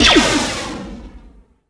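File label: weapon blaster fire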